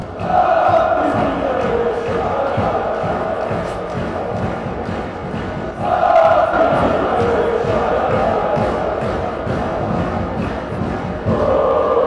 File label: rapid chant